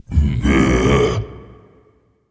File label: tongue; smite; kukulkan